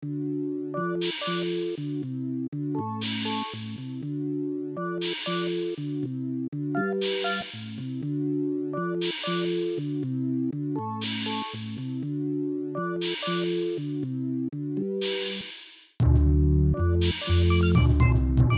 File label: Sine Wave